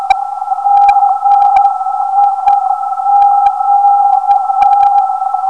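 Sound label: 426.5kHz, non-directional beacon; MIQ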